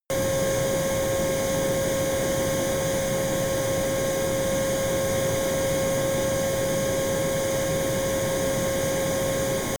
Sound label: plane cessna private turbine idle direct